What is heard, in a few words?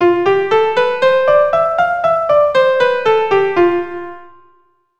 C Major Lydian, keyboard